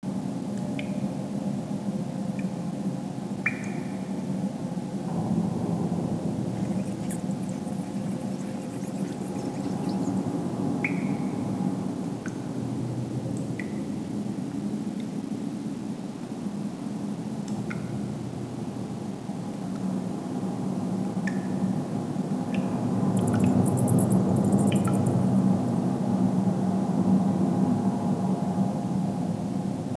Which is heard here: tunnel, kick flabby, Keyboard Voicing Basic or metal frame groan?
tunnel